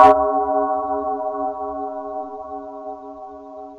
drum hit finish

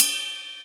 Ride

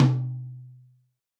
tom d5

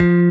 bass

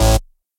bass

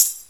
Ring

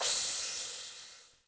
crash

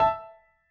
piano

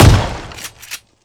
Shotgun; osu; Ratchet and Clank

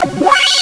synth; osu

